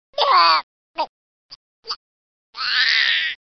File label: Baby; Cheesy Lo-Fi Sound Effects